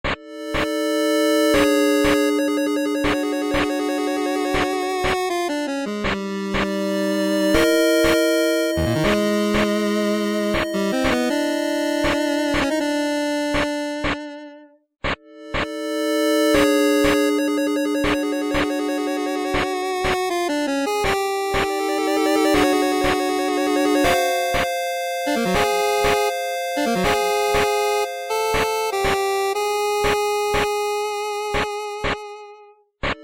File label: 8-Bit; Den of Trials Portal